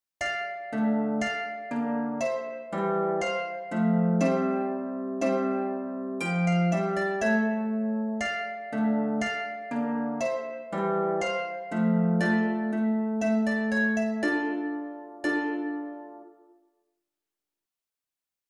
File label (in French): sanctus harpe; St Jean